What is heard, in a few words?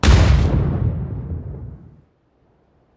explode